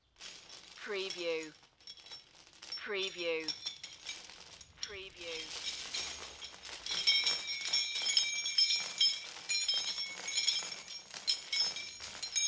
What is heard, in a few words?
Chains Moving